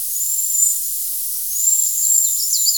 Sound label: waxwing, birdsongs